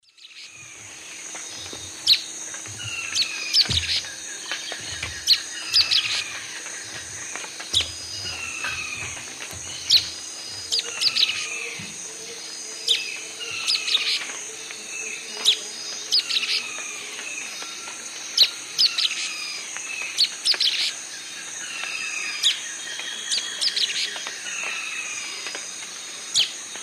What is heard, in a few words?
Myiozetetes similis, dawn song; S. America; Eastern Lowlands